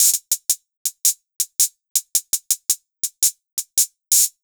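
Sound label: 110 hi hat loop, Ragga & Dub Reggae; USB Soundscan vol.27; AKAI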